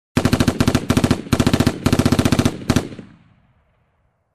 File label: Dark heavy machine gun sound